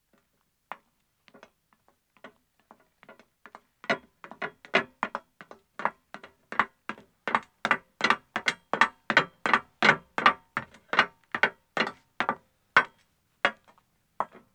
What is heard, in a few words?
Metal Stairs Descending, 1 Wom, Footsteps; BBC Sound Effects Library; Footsteps II